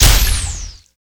FAST SHOT

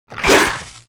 attack

